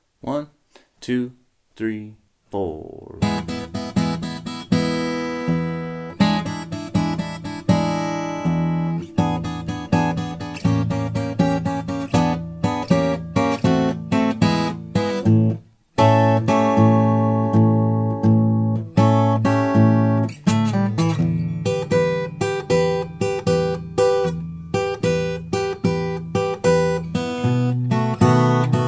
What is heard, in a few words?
driftin away blues, acoustic